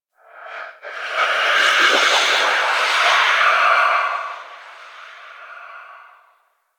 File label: Attack Vocal; Enemies; Stalker Acolyte